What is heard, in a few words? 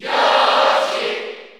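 Cheer Spanish, crowd; Yoshi; SSB4; SSBU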